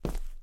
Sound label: footstep tile